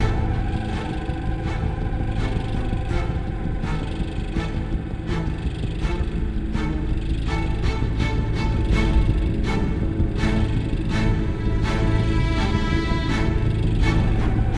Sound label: STRING INTRO LP